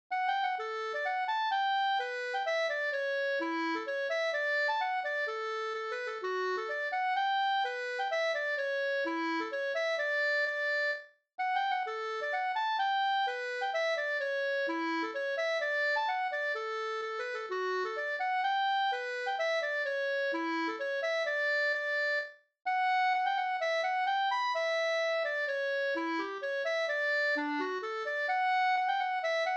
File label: mazurka; e Collin